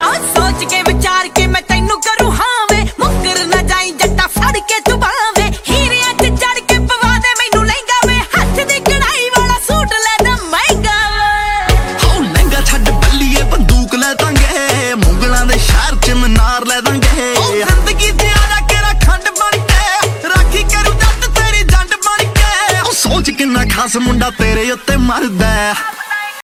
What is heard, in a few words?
Dance Punjabi Ringtone